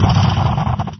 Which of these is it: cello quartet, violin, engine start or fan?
engine start